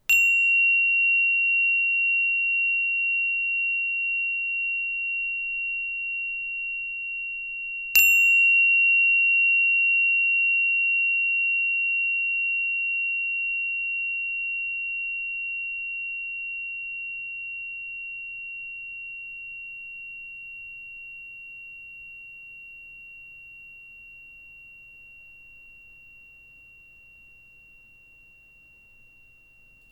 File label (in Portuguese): Pin Fa, instrumentos de quartzo; escutar instrumentos de quartzo